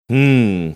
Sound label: hmm